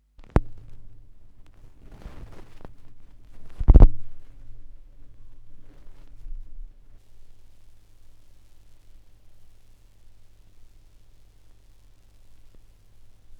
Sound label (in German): schallplattengeraeusche, Vinyl